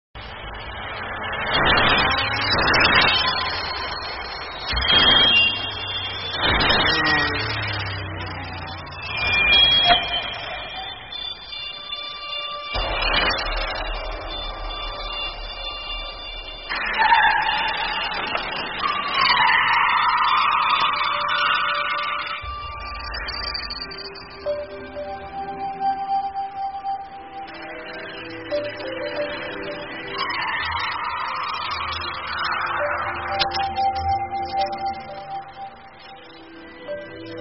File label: sad tango 日语; 日韩歌曲